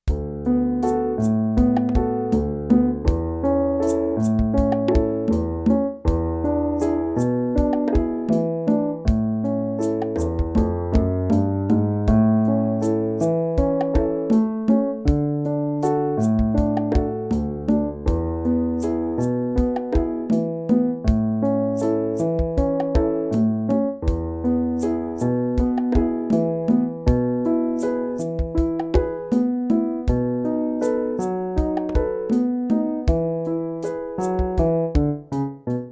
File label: Calypso accompaniment; heypr for violin bk 2 silver